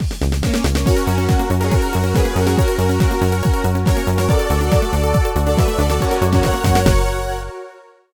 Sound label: level complete; msx; roadfighter